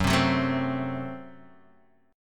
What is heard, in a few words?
Gb Diminished, down, Guitar, Standard; strummed; JGuitar